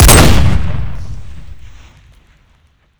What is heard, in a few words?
barrett, weapons